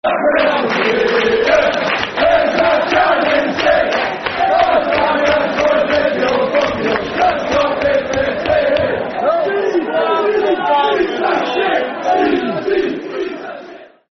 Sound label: Who's That Jumping Off The Pier [Football Chant (Soccer Songs)]; Preston North End Pne